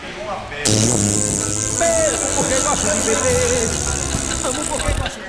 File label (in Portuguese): peido com a boca